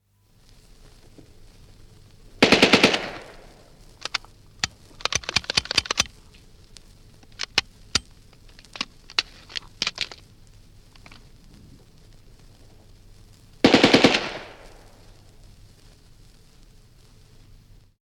Short B, Short burst of a Bren gun which jams, then further short burst; from 78 disc; Machine Gun; BBC